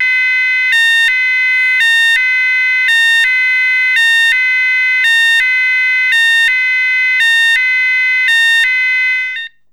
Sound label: Sounder